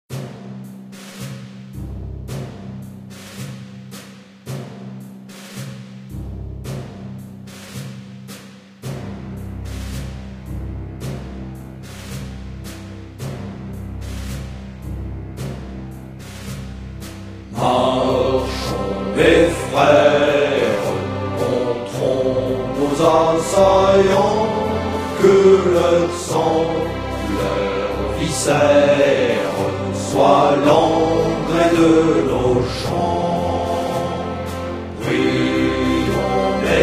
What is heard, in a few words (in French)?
hymne franconie; reflets d acide